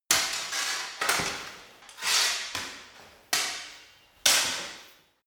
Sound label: sword hit sword sequence